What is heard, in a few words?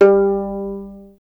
STR VLN, Solo Instruments; Miroslav Vitous